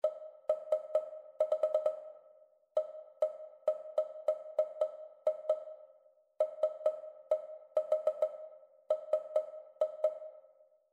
rhythmic error detection

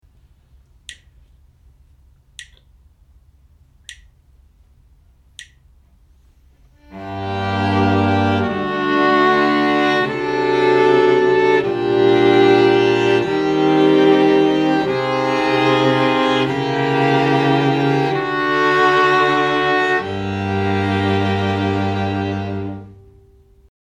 harmonic dictation; ear training

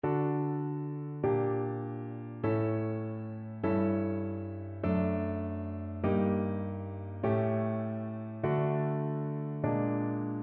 harmonic error detection

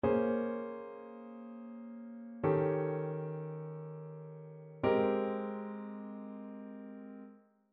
ii V I progressions; ear training

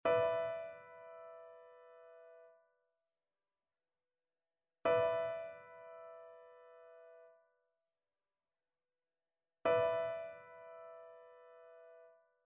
trichord; trichord identification

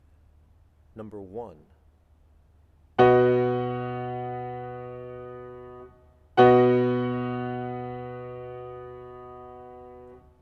chords

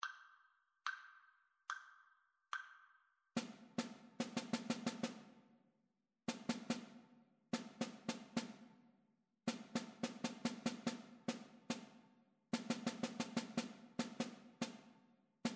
rhythmic dictation; ear training

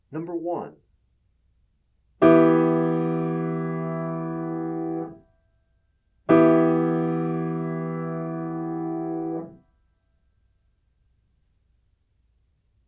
chords

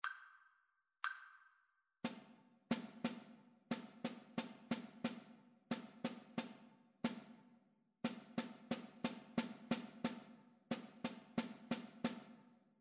rhythmic dictation; ear training

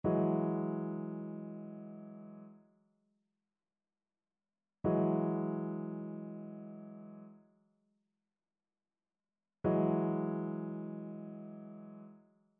trichord; trichord identification

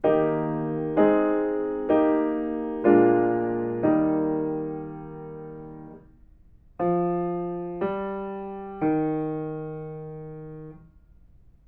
melodic fragments

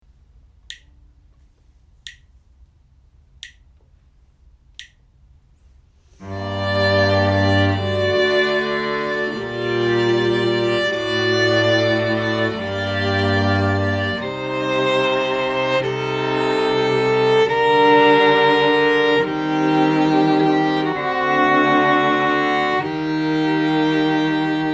harmonic error detection

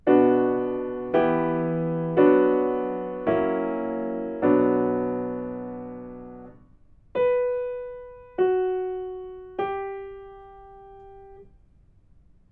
melodic fragments; ear training

